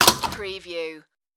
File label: aluminum door close